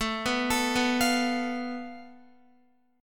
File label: A Suspended 2nd Sharp 5th, Guitar chord; arpeggiated; JGuitar